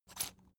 salt mill squeak soft; berufe; gastronomie

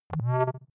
click swell filter saw, signale

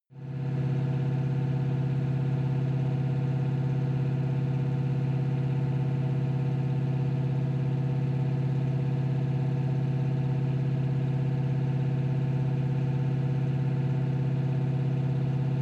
car camaro high rpm exhaust

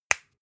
spice jar smack close

